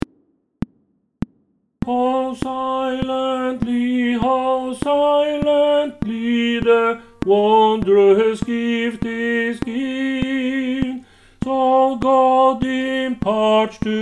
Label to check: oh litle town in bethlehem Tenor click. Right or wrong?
Right